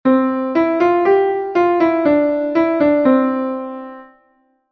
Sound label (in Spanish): tonalidad reposo